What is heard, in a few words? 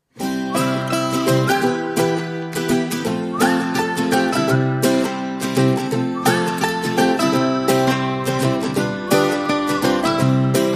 Guitars and Whistles Only